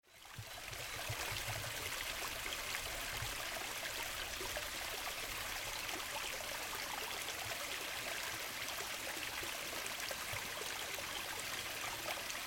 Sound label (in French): ruisseau; Un poème pour saluer la fin de l’hiver…